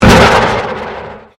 Convolution Pulse

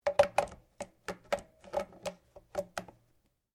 Rifle CZ 452 Varmint 22 Calibre Scope Attach; Guns; A selection of domesttic firearms; Rifles

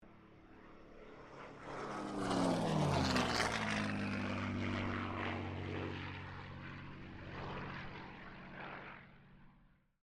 AIRCRAFT CA-18 MUSTANG PASS

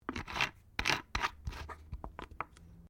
Bottle Plastic Water Cap Plastic Screw On; Various bottles; Plastic (Sound Effects:Materials)

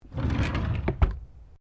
Drawer Laminate Medium Empty Quiet Close; Various domestic drawers; Household

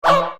Droid Dog, robot sound effects

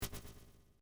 Beep Electronic Slash Feedback; User interface feedback sounds; Beeps; Multimedia; SoundEffects-Foundation1

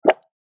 Suction Plop; Cartoon

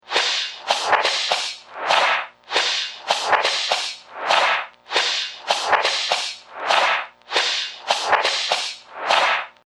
Sharp Steel Machine Loop, machinery

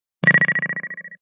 Short Ring

